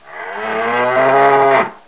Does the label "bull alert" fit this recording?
Yes